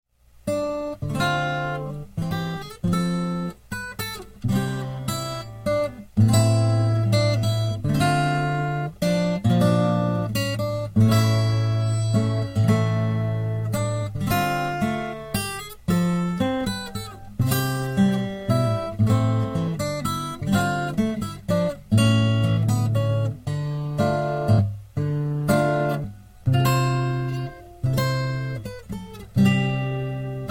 what child is this greensleeves fingerstyle

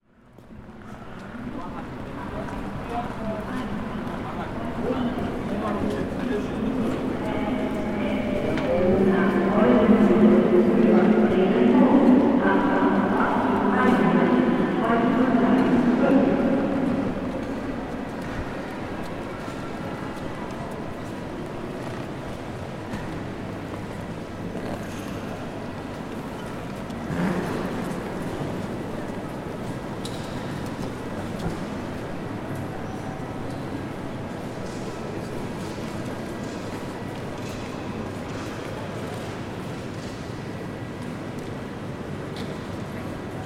Bremen Hauptbahnhof announcements and ambience

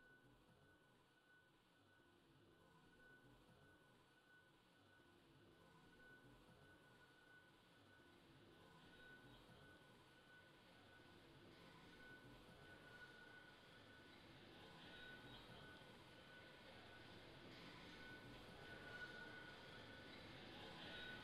new street ambience

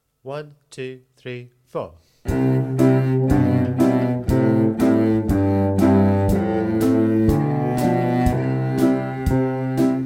listen to the rhythm cello

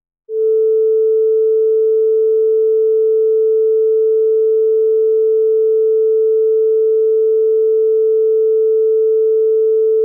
tuning note

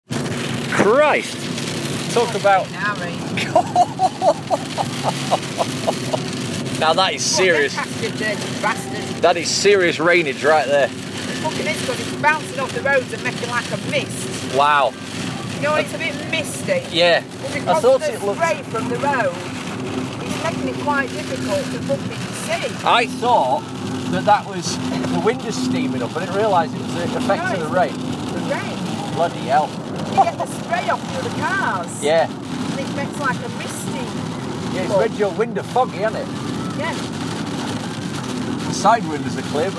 still driving along and heavy rain

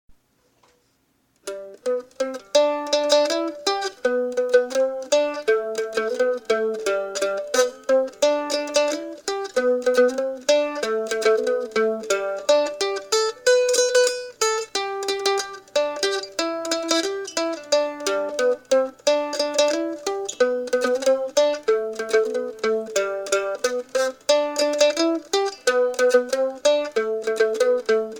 mandolin; mandolin monday

